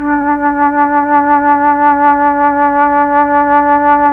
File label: FLT, Melotron Flt, Tron Flutes